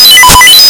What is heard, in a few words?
DCF77 Tuning Test